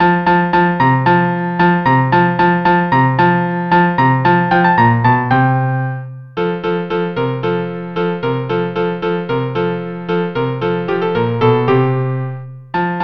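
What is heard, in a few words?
Autumn violin cello 1st movement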